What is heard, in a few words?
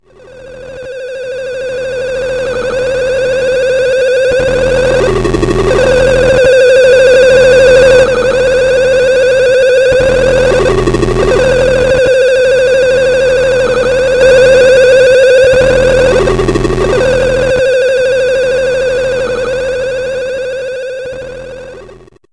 blippy